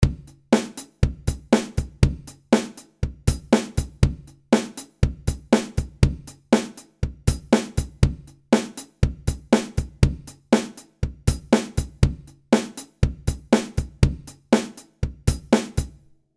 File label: drums